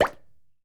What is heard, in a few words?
reel stop; netent